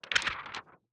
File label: Parched, paper, movement, linen, shake, crimp, wobble; Eiravaein Sound